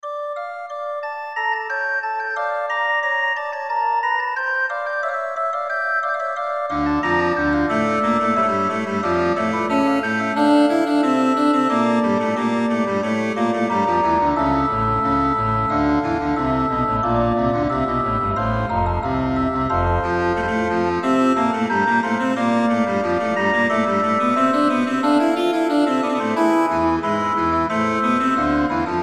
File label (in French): Basse de Cromorne; Marchand; Virtual Virtuoso